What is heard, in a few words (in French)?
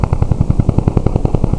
hélico; MOBICLIC 13